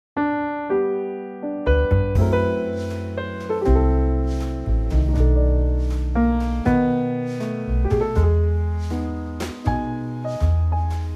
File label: trio; key - Ab; Gorgeous reworking